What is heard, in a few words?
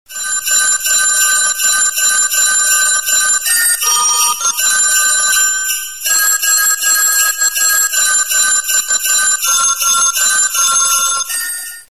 ringtone jingle bells phone